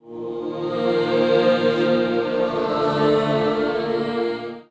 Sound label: Nun Vox, Voice; Sonic Foundry (Sony Creative Software) - Friendly Fire; DroneLoop